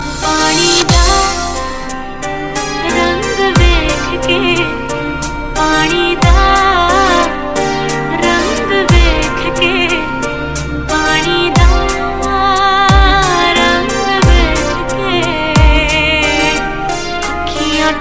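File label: Dream Version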